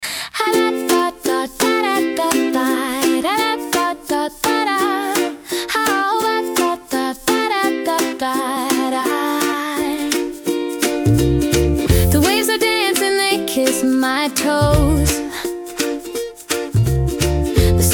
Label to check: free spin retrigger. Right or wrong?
Wrong